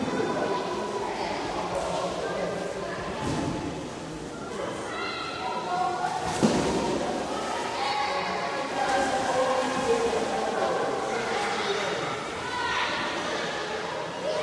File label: big hall; Original TK Sounds